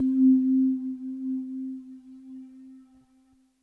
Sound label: SINUS C3; Music Store; 90's Sample CDs; Yamaha VP-1; NICE SINUS